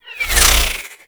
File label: Cluster Shot Whizzby; Enemies; Abilities; Warframe; Teralyst